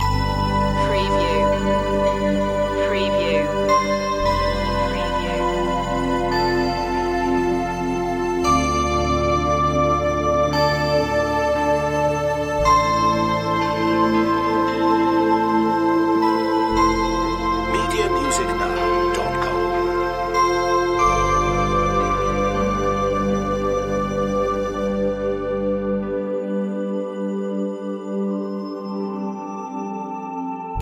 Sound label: perfect bliss loop